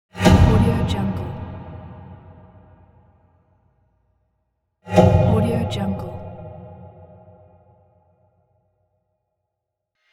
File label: punch kick transition